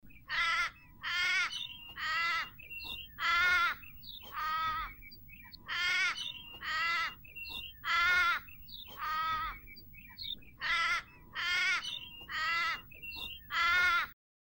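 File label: Baby kangaroo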